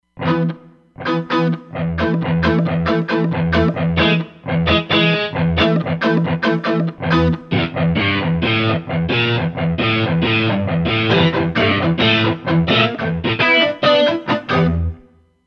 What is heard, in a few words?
Touch Drive, Roland Fantom XR